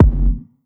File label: Kick Washer, 808 Kicks; Slate; Vintage Drum Machines Volume 2 808 Edition